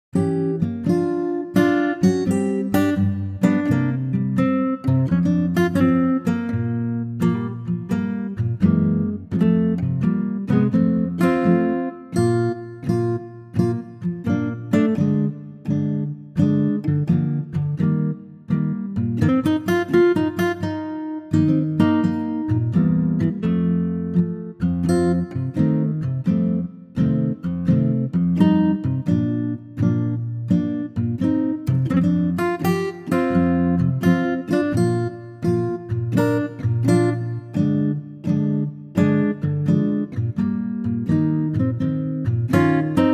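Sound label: Bb to B guitar, male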